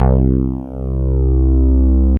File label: Roland D-20 Fat Synth Bass C2